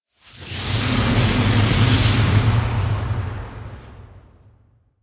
Missile Fly; blue archive

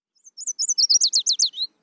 Bird; blue archive

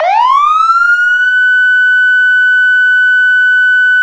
manual, sirens; federal sig omega 90

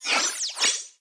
Lobby Animation; blue archive

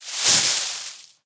Hide Bush; blue archive

